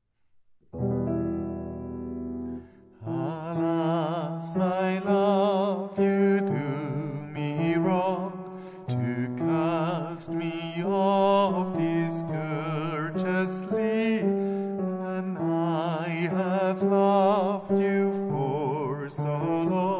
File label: Greensleeves Bariton